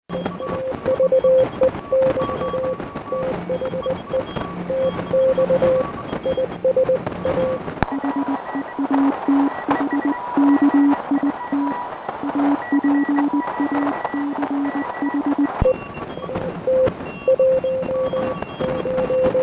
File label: rockmite